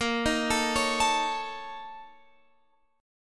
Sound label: Bb Minor Major 7th Double Flat 5th down Guitar Open F; BbmM7bb5; arpeggiated; JGuitar